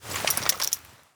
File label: draw; tacz; RFP v1.0.0 beta2